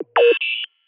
received objective; Allegiance

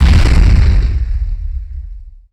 colision large; Allegiance

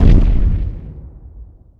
hit rock; Allegiance

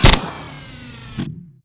minigun; Allegiance

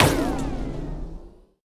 hit me armor; Allegiance

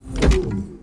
panel; Allegiance

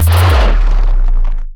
hit me shield; Allegiance

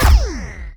ion cannon; Allegiance

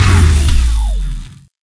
turret gun; Allegiance

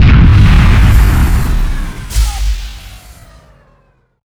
turret cannon; Allegiance

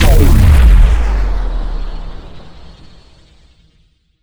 ripcord; Allegiance

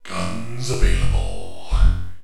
gun able; allegiance

